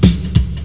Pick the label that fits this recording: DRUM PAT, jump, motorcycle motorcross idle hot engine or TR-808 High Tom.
DRUM PAT